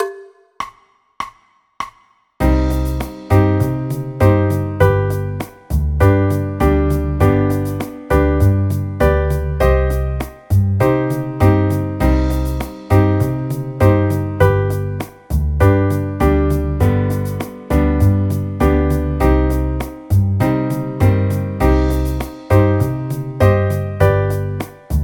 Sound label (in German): c moll akkordverbindungen